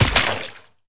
water fall; Golden Crayon Sounds

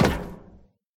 metal step; Golden Crayon Sounds

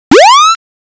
SOS Countdown Tick

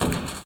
roof step; Golden Crayon Sounds

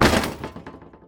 wall computer; Golden Crayon Sounds

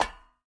ladder step; Original TK Sounds

